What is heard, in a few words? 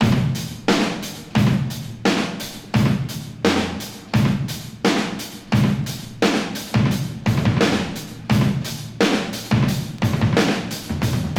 87 bpm Modern Drum Groove C Key, Free drum loop sample - kick tuned to the C note. Loudest frequency: 1184Hz